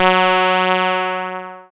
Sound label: SYN PULS SY, Microwave; Sound & Vision - Gigapack I CD 2